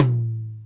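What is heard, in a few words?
Tom Hi Studio; Alesis D4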